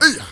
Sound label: HEY HEY, MALE NOISE, Heart of Africa; Spectrasonics; Heart of Africa 1